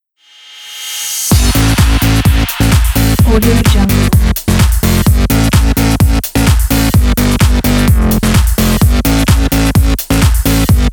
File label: background bass theme